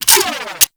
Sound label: Minigame Cancel; DE; Warframe; Grineer